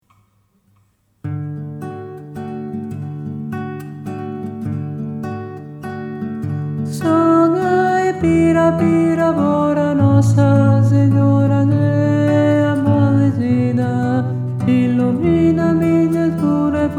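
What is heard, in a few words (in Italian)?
contralto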